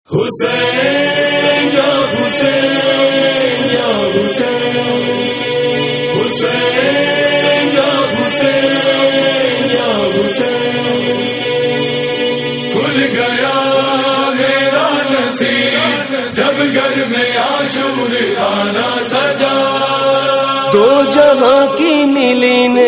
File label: Noha; Farsh-E-Aza